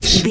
horse breath huff

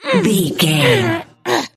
voice female effort push

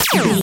sci-fi weapon laser small

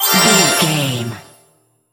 magic general item collect

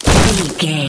nature spell vines tree impact

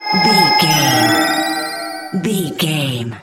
twinkle glitter dark spell

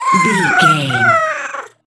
goblin fairy death

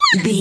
voice fun creature small mutant voice emotes

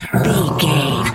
dog growl

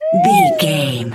whistle slide wobble notes short

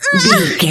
voice female hurt pain

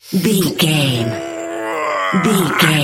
voice male effort long

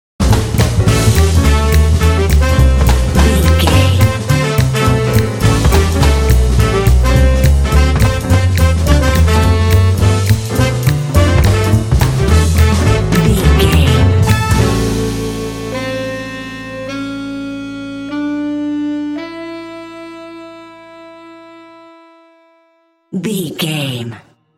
jazz hipster ad jingle sting

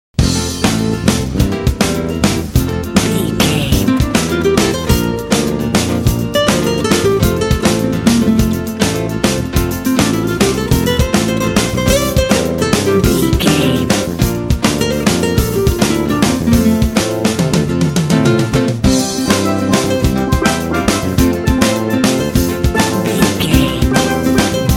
latin fun time

